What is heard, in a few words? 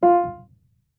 marimba piano; ゆめ2っき